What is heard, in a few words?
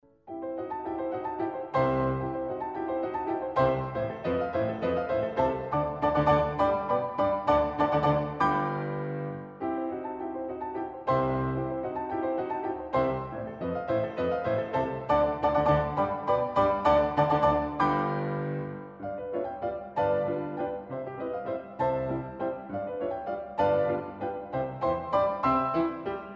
German Dances; Franz Schubert